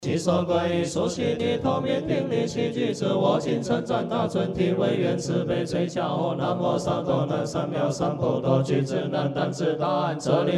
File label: Cundi Mantra, chanting; chanting series sound tracks